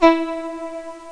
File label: VIOLA